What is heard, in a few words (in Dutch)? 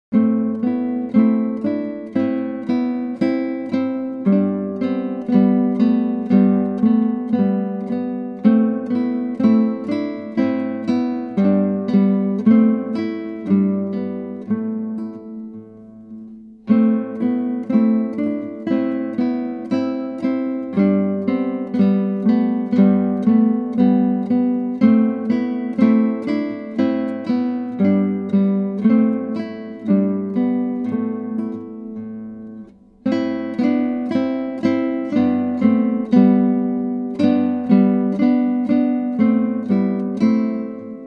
Gitaarboek Celtic Dance